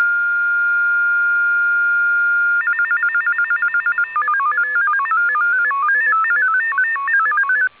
ALCATEL 801H 8FSK 16; ISS mode; Alcatel 801H 8-tone MFSK system running 16.67 Bd / 50 bps (ISS mode); ALCATEL 801H MODEM 8-TONE

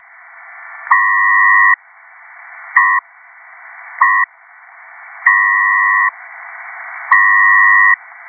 PACTOR-III 2, Pactor-III 2-tone mode

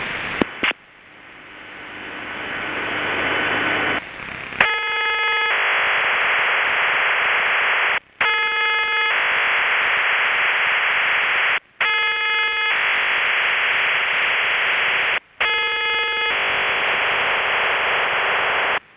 OFDM-112 BURST, Russian 112-tone OFDM; Russian 112-tone OFDM waveform with burst traffic; RUSSIAN HDR MODEM; PSK-systems

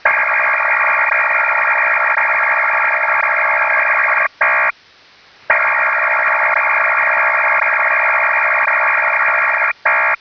CLOVER 2000 8P2A

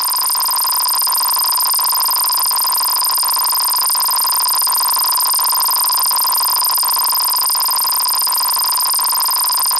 RUS 4FSK 150Bd 12kHz

